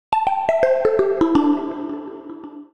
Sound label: bellz A Minor 83 bpm; MIDI Clips; Tonal; Melodic; Oldbellz; Ableton; Factory Packs; Chop and Swing